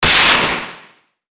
gun; easyrpg; WitchsHeart

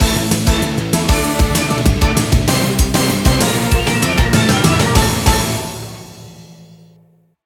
Action or Speed, Music; TMNT

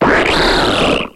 Cry; Pokemon Essentials; Pokemon Iberia V1.03

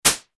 footstep grass field; easyrpg; WitchsHeart

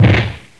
Strike; easyrpg; Ib

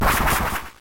fly bird; Pokemon Essentials; Pokemon Opalo

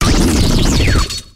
Cry; Pokemon Essentials; Pokemon Iberia V1.03

